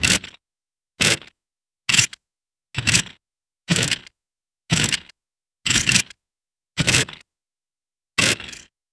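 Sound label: heavy animatronic footsteps, hard floor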